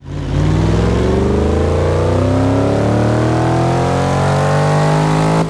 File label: first, vehicles; dod ram 1500